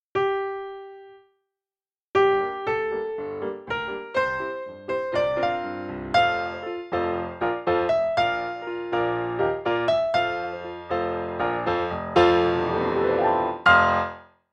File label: Melody